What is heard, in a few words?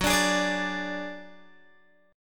Gb Suspended 2nd Sharp 5th Guitar DADGAD